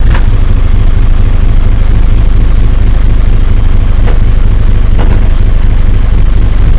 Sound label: tank idle; dod